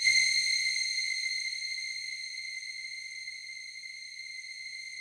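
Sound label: Rough Strings C1, Yamaha VP-1; Music Store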